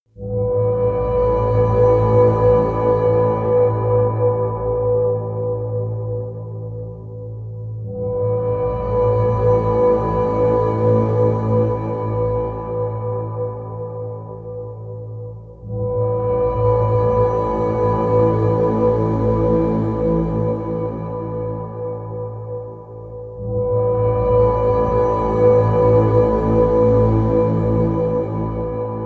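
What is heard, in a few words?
night breath, pads; flaechen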